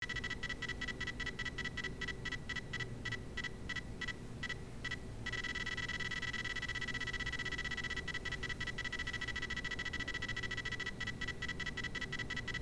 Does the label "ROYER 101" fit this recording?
No